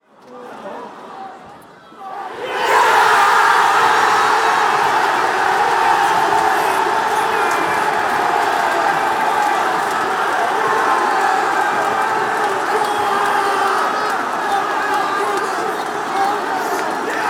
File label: goal, fanchants